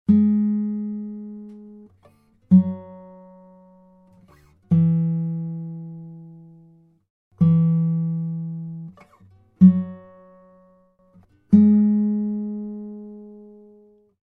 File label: Deadspot Fis